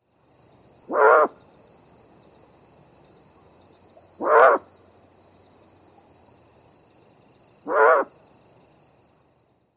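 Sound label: Great Horned Owl barks adult alarm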